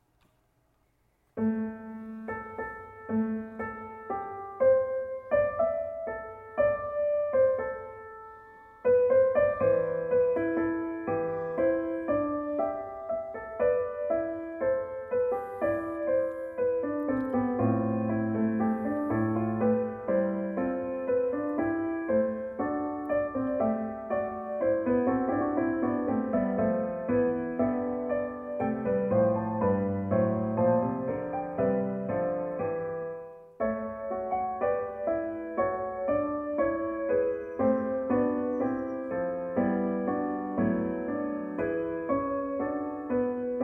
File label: Fugue, piano; Anna P